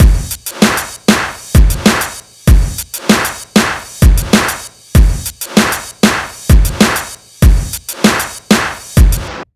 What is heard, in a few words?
Hip Hop Beat, Drums